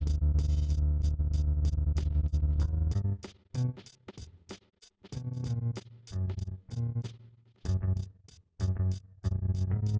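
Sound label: with drums inst sim; SASSEC07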